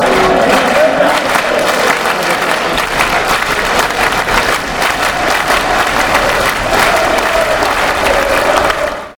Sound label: clapping fanchant